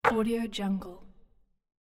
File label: basketball net